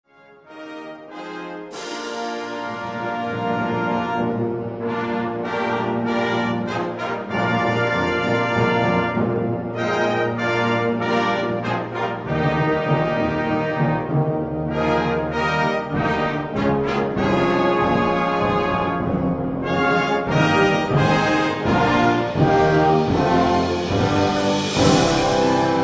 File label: Fanfare